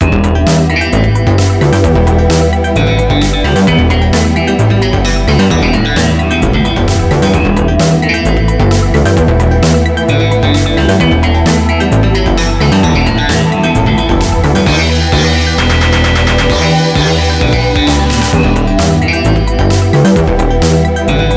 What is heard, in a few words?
Beat; SD Snatcher